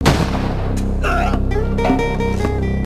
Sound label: death; singe